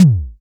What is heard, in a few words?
Tom Short, Tama TS-206